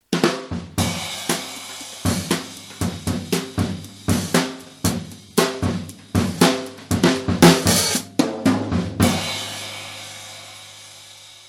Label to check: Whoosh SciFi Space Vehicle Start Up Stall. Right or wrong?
Wrong